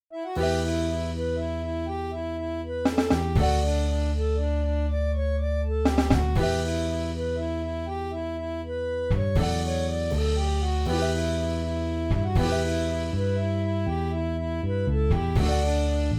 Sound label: Jig